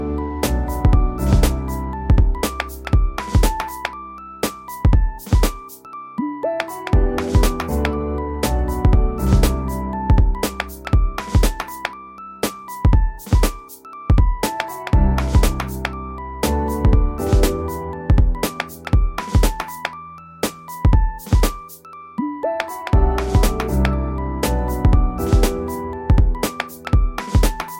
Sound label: Huawei Y9 Ringtone